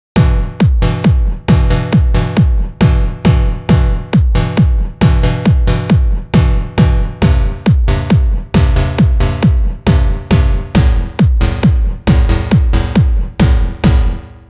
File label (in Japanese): ベースの打ち込み